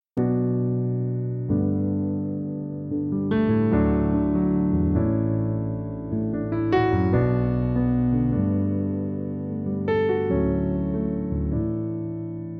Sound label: Piano Version